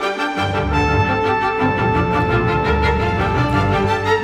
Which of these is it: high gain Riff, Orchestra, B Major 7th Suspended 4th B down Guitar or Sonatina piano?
Orchestra